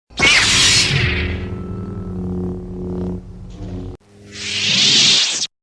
lightsaber

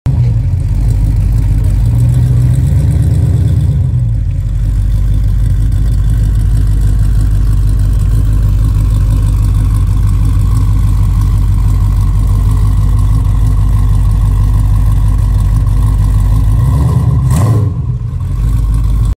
chrysler

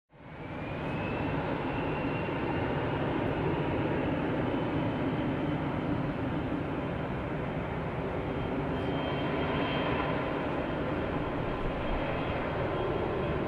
Airplane Take Off

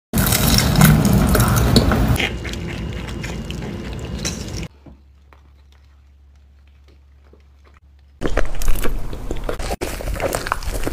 fast food, خوردن

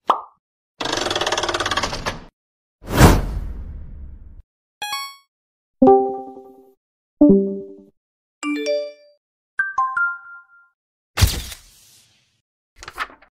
Children's game

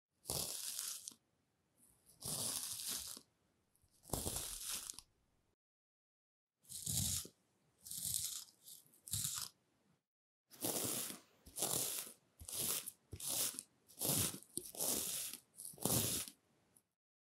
shaving face

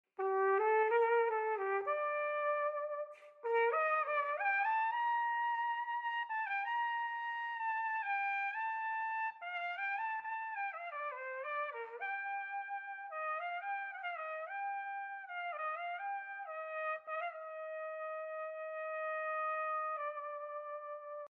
Cornet; کورنت

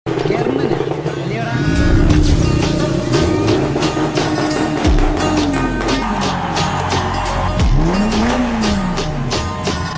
Car Showroom; نمایشگاه ماشین

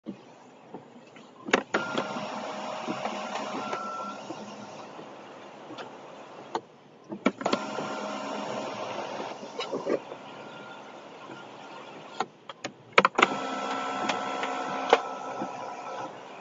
sunroof; سانروف ماشین

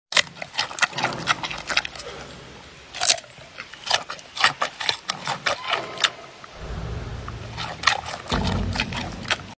Panda eating bamboo

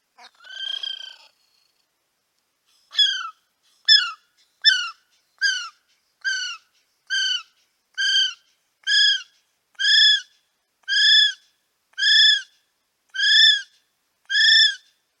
Kiwi; پرنده کیوی

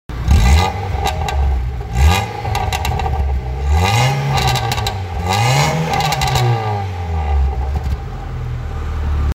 Bee exhaust

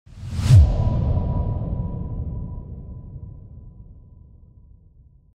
hit for teaser; ضربه برای تیزر

